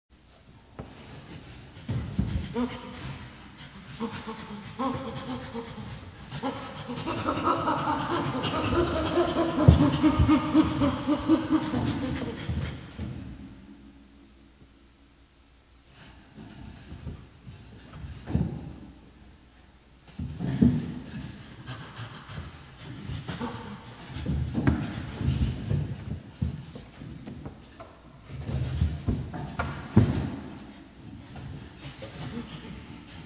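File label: shimpanze golos, chimpanzee voice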